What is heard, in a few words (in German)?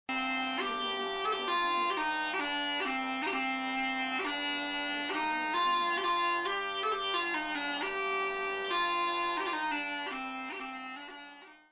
sackpfeife